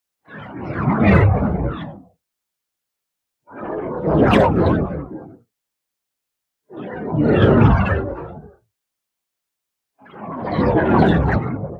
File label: WHOOSH MEDIUM Fluid Force; boom library; Matter